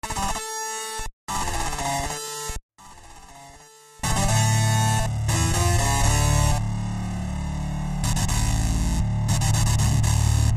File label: toccata